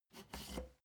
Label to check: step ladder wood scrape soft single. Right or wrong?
Right